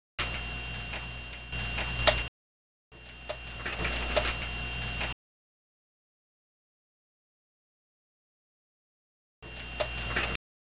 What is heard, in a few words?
hiss and clicks; 129BPM; Pony Fan Music Remix Stems